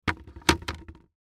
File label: Bucket Empty, Bucket Empty Plastic Five Gallon Set Down Handle; Factory; Blastwave